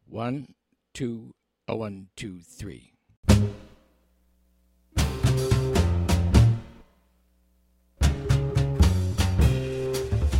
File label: bass drums only